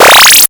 Power Up

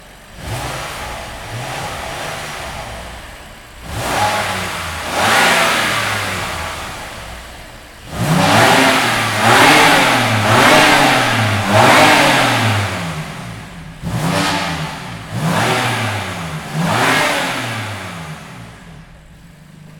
Motor Bmw Sound

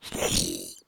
Grunt

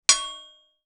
Anvil Strike

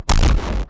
Electronic Bomb

